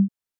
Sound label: grunt